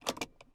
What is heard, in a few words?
Aircrafts P-51D Cockpit Control Lock On; DCS